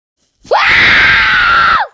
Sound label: super angry scream